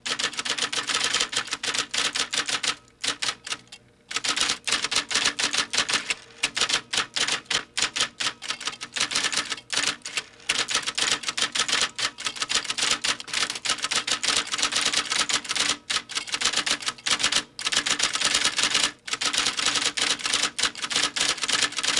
E-Typewriter Type Fast; Home & Office; Sony Pictures Sound Effects Series Volumes 1-10; Sony Creative Software

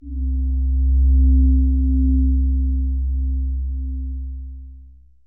Bowed Vibe, Hits & Tones; Sony Pictures Sound Effects Series Volumes 1-10; Sony Creative Software

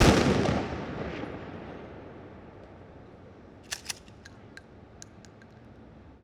Mossberg Shotgun Blast & Reload Wet; Weapons & Firearms; Sony Pictures Sound Effects Series Volumes 1-10; Sony Creative Software

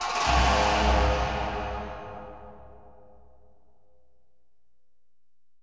Tibetan Musical Hit; Hits & Tones; Sony Pictures Sound Effects Series Volumes 1-10; Sony Creative Software

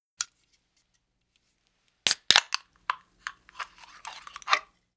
Can Open; Home & Office; Sony Pictures Sound Effects Series Volumes 1-10; Sony Creative Software

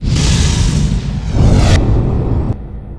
Trap Web; RYL 2 v1673; Private RYL

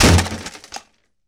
Metal & Wood Impact; Impacts & Destruction Sweeteners; Sony Pictures Sound Effects Series Volumes 1-10; Sony Creative Software

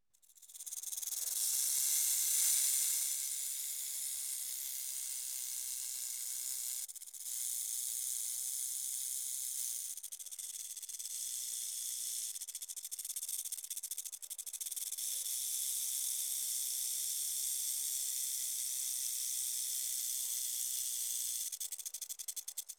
Snake Rattle; Animals; Sony Pictures Sound Effects Series Volumes 1-10; Sony Creative Software

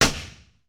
Pistol Shot 22cal; Weapons & Firearms; Sony Pictures Sound Effects Series Volumes 1-10; Sony Creative Software

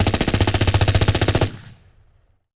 German Assault Rifle Long Burst; Weapons & Firearms; Sony Pictures Sound Effects Series Volumes 1-10; Sony Creative Software

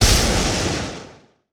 Lightning Strike, Thunder & Lightning; Sony Pictures Sound Effects Series Volumes 1-10; Sony Creative Software

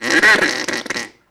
Bronx Cheer, Comedy Vocals; Vintage Cartoon; Sony Pictures Sound Effects Series Volumes 1-10; Sony Creative Software

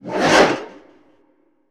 Ultra Pan Movement; Sound Design - Fantasy; Sony Pictures Sound Effects Series Volumes 1-10; Sony Creative Software

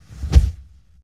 Flash Fire Ignite; Impacts & Destruction Sweeteners; Sony Pictures Sound Effects Series Volumes 1-10; Sony Creative Software

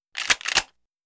Shotgun Pump Single; Weapons & Explosions; Sony Pictures Sound Effects Series Volumes 1-10; Sony Creative Software

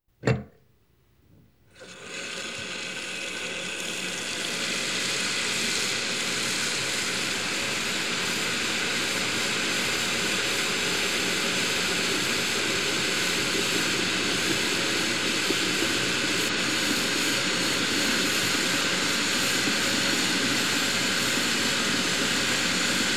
Shower On And Run; Home & Office; Sony Pictures Sound Effects Series Volumes 1-10; Sony Creative Software

